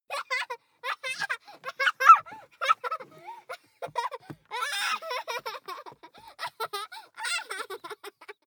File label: child laugh tickle